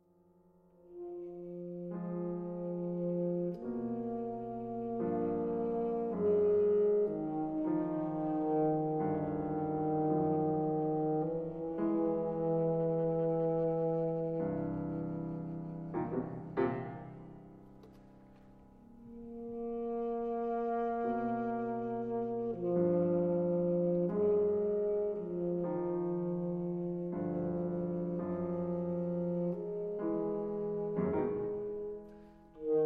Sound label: Sax